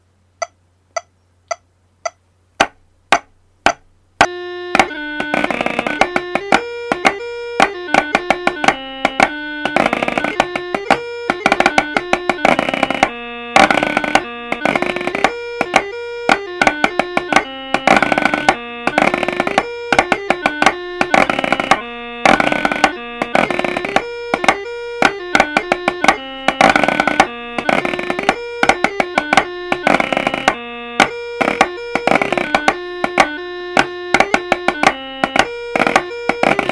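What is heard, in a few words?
Highland Fling Chanter Snare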